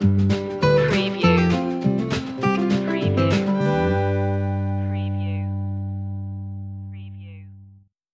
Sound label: spring air stinger